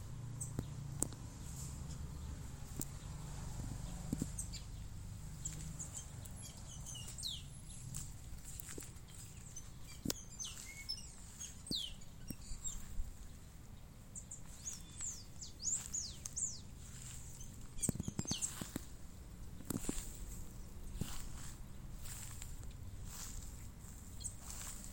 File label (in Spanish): TORDO MUSICO, Asa-de-telha (Agelaioides badius)